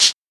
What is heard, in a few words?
Breaking; molecules-and-light